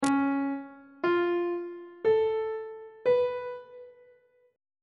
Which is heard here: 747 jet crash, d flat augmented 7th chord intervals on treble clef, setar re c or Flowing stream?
d flat augmented 7th chord intervals on treble clef